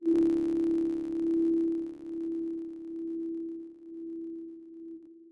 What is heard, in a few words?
E7 sharp 9 e